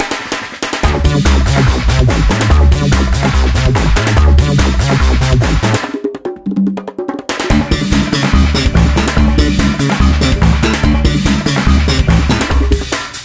Boss Fight; crash bandicoot on the run alpha version android gamerip 2021

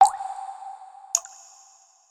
Cave Water Drip; Children of Morta

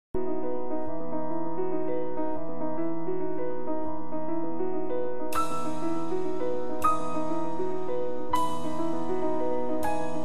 Jazz; Nostalgic Rain

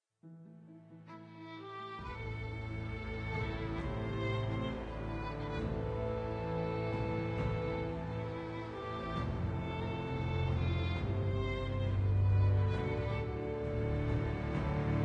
mead hall; devils demons android game music

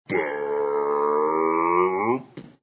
Burp; Feed Fribbit Colors

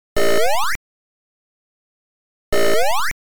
Pepper Attack Success; Oretachi Gesen Zoku; BurgerTime Music CD

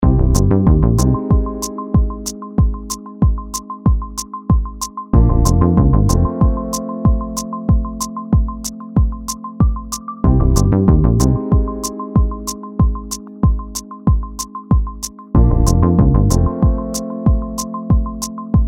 Intro Music; dokobots

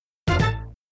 Bonus Add; Indiana Jones The Pinball Adventure; Williams; arcade

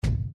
Dirt Block Rebuilt; Scuba

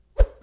Sound Arrow Release

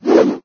Axe Release

